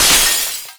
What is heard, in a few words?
Mirror Crash; gamerip; disney star smash; android; Enemy Poisonwizard